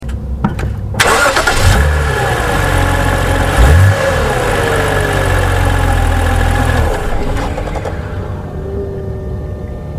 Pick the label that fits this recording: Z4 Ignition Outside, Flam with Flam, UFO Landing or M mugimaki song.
Z4 Ignition Outside